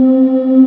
halo pad; cubic

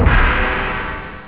stabs; da capo vol1; instruments

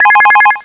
Digital, Ringtones; phonetray; PhoneTrayDialup

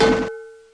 Explode; SpacHawk

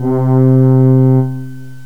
French Horn; GMPlay

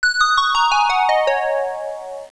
Music; Princess and the Pea; SoftKey

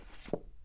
foley foot scuff; Earth and Beyond; EA Games

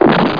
shotgun; Total Chaos Nukem 3d Pack; duke

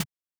brush; Mistergenerator

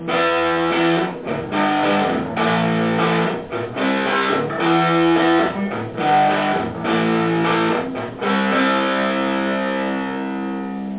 dist, Guitar

